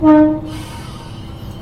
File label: ISUMI Horn